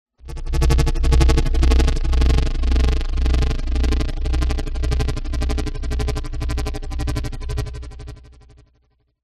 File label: sound design pulsating stutter mid low; zapsplat